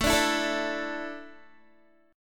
A Suspended 4th, Guitar; Asus4; strummed; 16,17,16,14,14,x

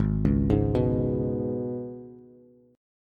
Ab Minor Double Flat 5th down Bass; Abmbb5; arpeggiated

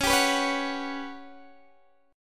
G Major 7th Flat 5th

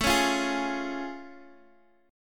A Augmented Major 7th A Guitar DADGAD

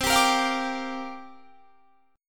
Bb 6th C; Bb6/C strummed

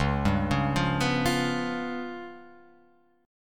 C sharp 7th Flat 5th C sharp down Guitar Open F; C#7b5; arpeggiated; JGuitar

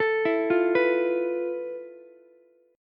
F Major 7th Flat 5th Ukulele; arpeggiated; JGuitar

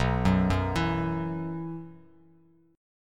B Suspended 4th, Bass Standard; Bsus4 arpeggiated; JGuitar

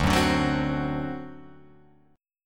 D 7th, down, Guitar, Open F; D7 strummed; JGuitar

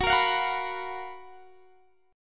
Gb Major 11th, down, Guitar, Drop D

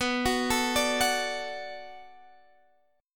B-Minor 7th B down Guitar Drop D

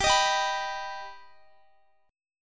G Augmented G Guitar; strummed

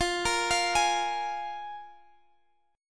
F Minor Double Flat 5th, F, Guitar, Drop D; x,20,20,22,21,x; down; chord shape

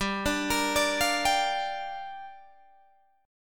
G Minor 7th, Guitar, DADGAD

